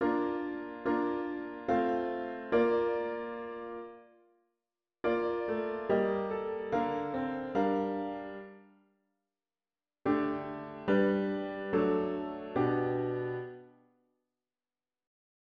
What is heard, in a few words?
Bach Chorale